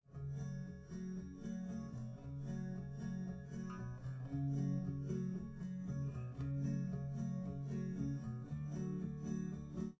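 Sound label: with drums, live rec; SiSEC11; underdetermined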